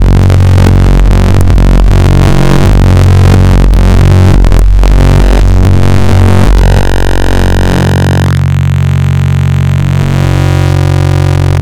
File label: wavetable